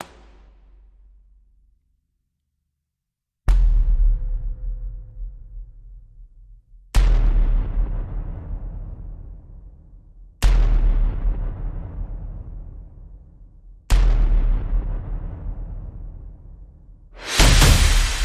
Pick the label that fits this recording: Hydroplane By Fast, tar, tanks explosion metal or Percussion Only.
Percussion Only